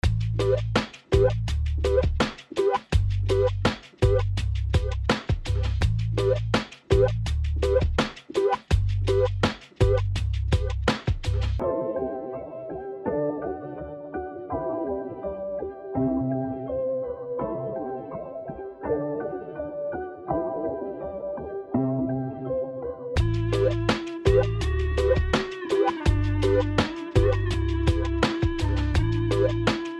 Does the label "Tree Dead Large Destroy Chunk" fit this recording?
No